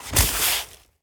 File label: Bodyfall Concrete; SFX Kit; Bodyfalls